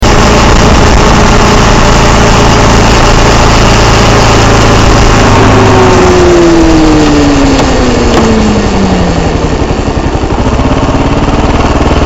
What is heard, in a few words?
noise example, klr650; what is this noise